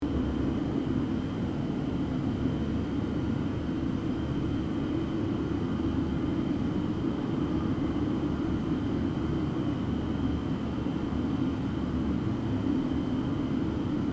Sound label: Attic room tone ambience wind blowing